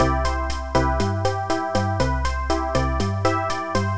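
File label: bossa nova, pc organ; medsonic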